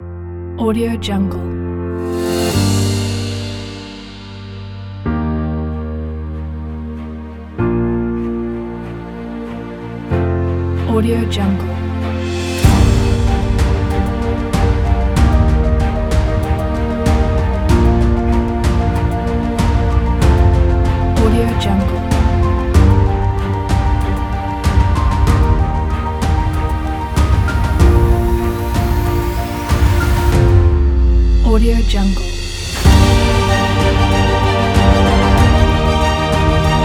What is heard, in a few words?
epic cinematic trailer pack